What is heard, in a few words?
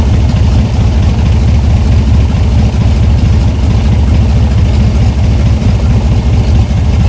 morava side, engines; War Thunder